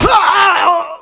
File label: die; outlaws